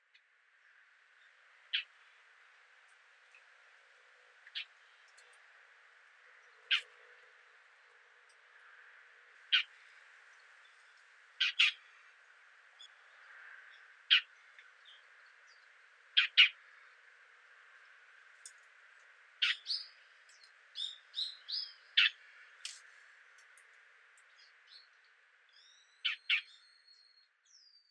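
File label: light-vented bulbul call, okinawa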